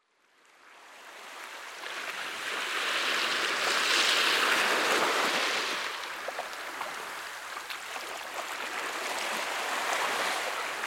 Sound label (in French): Chants de l eau mer calme